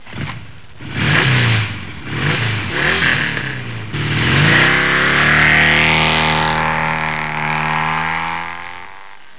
Suzuki; MISC VHCL

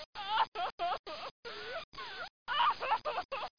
sobbing

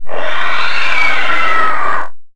attack; desert

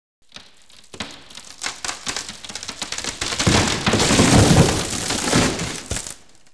plywood, trees; KABOOM! - Wild and Wacky Sound Software; outdoors

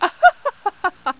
laugh; Leonardo The Inventor; Broderbund; Riverdeep

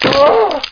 land hurt; Daikatana; superfly

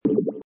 upgrade; star-rebellion

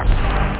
synth chords; da capo vol1

